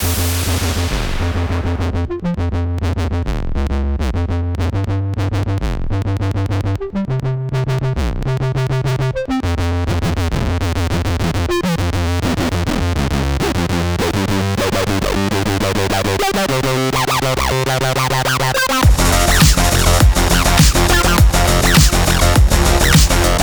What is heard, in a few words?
Combat loop; Wing